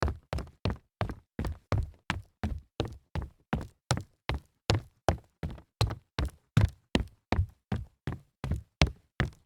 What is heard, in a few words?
sneaker jog hollow wood